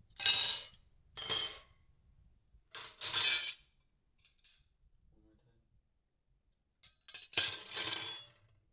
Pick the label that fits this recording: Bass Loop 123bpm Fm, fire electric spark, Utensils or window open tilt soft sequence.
Utensils